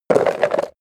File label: horse hooves clack stop; natur-tiere; pferde